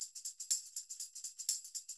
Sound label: TAMB, Percussionworks; Sampleheads; NYC Percussionworks; SF LOOPS 120